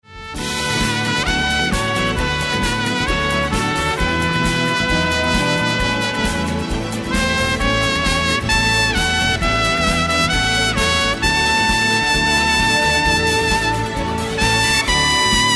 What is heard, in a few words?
tango, trompet